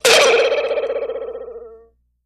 Boing Box Sharp

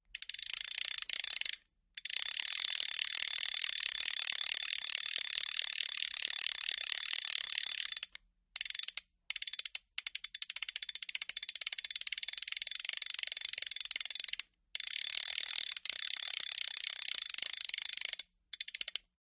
Fly Reel Articulate R, fishing

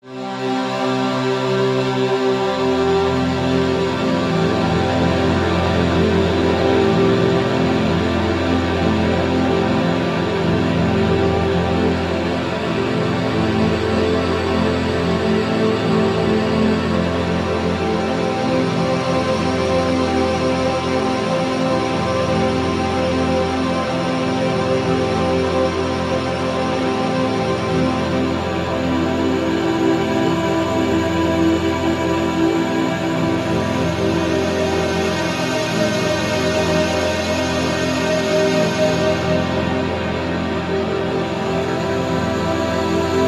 Orchestral Synth Wa, wash

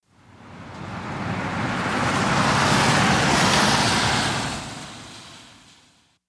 Individual cars pass on wet road.; TRAFFIC WET TRAFFIC: EXT B/G

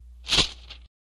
Page Turn Standard; paper mvmt

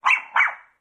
Dogs Small Dog Barks

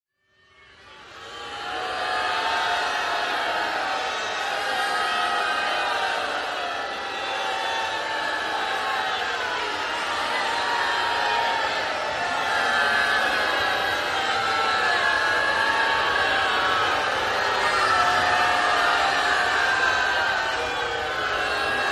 Football Crowd Stea, sports arena football

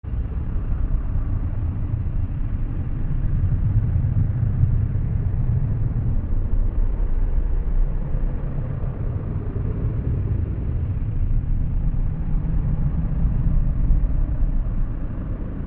Zenith Space Hvy Low Ton, rumble

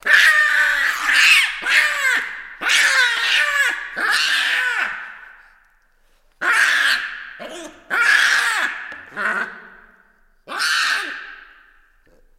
Baboon Multiple Deat

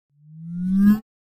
Button Tone Up Beep, buttons & beeps

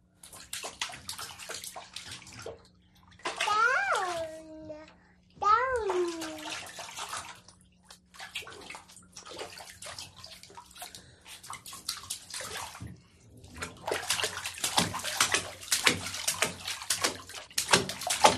Baby Girl Plays Bath, eighteen month old; BABY EIGHTEEN MONTH OLD GIRL: INT: Bathing, splashing, blowing bubbles

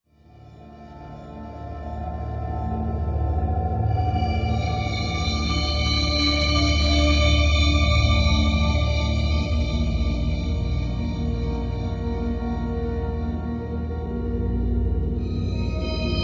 Searching Musical Echo, musical

